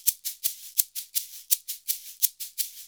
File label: SHAKER, Modern Percussion Loops